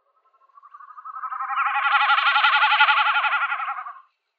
Bekassine Gallinago gallinago Meckern; Vogelstimmen

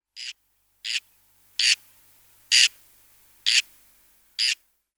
Weissbartseeschwalbe Chlidonias hybridus; Vogelstimmen

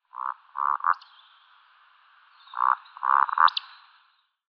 Waldschnepfe Scolopax rusticola, Vogelstimmen

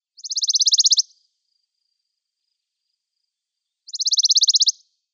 Balkanlaubsaenger Phylloscopus orientalis; Vogelstimmen

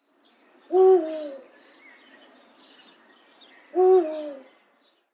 Turkmenen-Uhu Bubo bubo omissus; Vogelstimmen

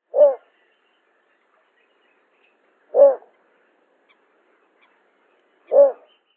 Zwergdommel Ixobrychus minutus; Vogelstimmen

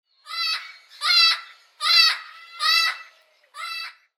Rotbugamazone Amazona aestiva, Vogelstimmen

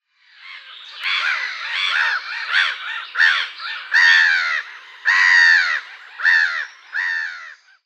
Lachmoewe Larus ridibundus; Vogelstimmen

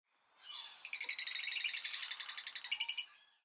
Unzertrennliche Agapornis, Vogelstimmen

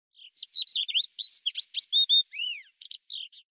Theklalerche Galerida theklae, Vogelstimmen

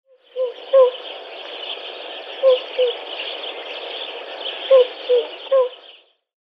Zwergschwan Cygnus columbianus bewickii; Vogelstimmen

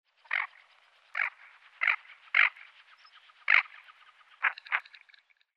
Spatelente Bucephala islandica; Vogelstimmen

